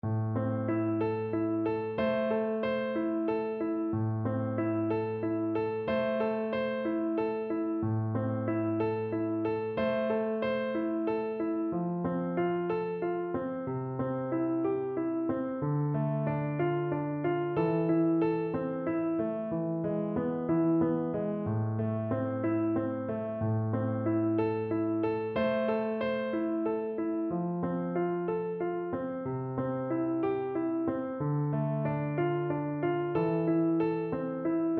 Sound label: Vltava FL kar, Flute